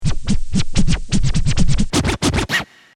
scratch; MOBICLIC 64

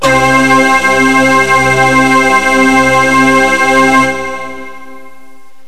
sing mix; Terra Sound Library; construction set; singers

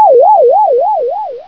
fade; Cyber Peep Interactive - LISA

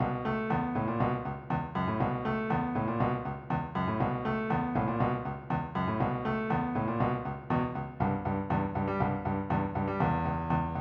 funk; Millennium Gold 2000

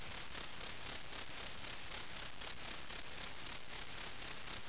night noise; Epic Interactive Encyclopedia

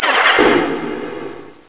Score, Pinball Demo Music; 3D Ultra Pinball Demo

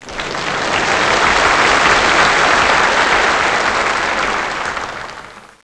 cheering; The Muppets

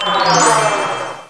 puzzle unlock; Ferazel's Wand Demo

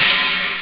sharp; OctaMED SoundStudio V1

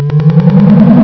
6-way appearance; Boxcars Casino

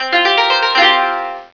Koto; Musashi

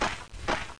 Step

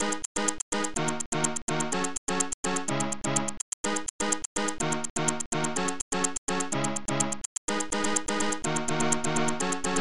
Noise

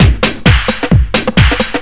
Housey Loop; Guitar&Electro

